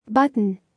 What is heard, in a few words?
button